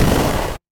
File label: retro game explosion